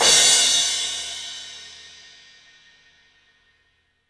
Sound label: CYMBALS; 3D Sound; 3D Drums